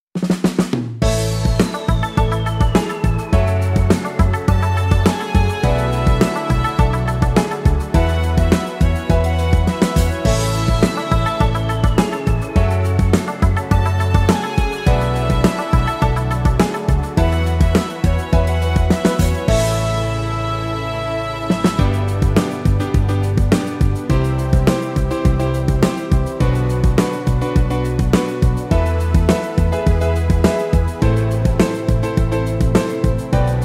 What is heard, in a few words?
Instrumental Karaoke Backing Track